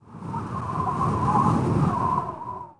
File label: WIND, wind; zones